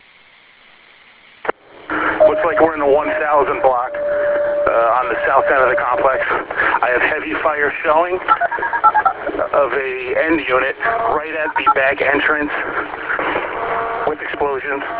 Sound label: arrival w explosion